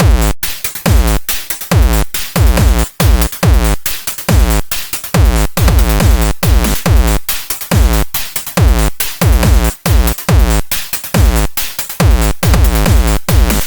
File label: Hard beat